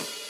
Hats Real Open

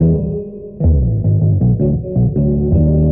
bass, Loops Phonky

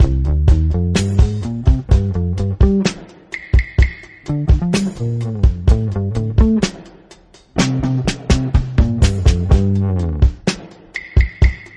bass included, Drum loops, Boombap

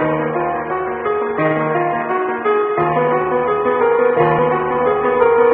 piano, sad

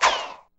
tarzan hits a tree; Crash Bang Boom

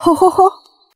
"ho ho ho", voice; ooh ahh

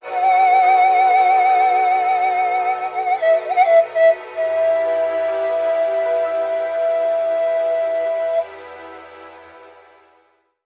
flute, Loops

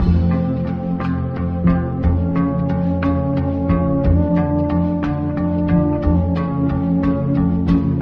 guitar horn doowop; Loops

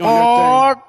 aw; ooh ahh

